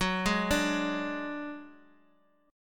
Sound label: F sharp Suspended 2nd Sharp 5th; arpeggiated; JGuitar